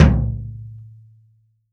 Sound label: BD, REAL DRUMS, JUNGLE KIT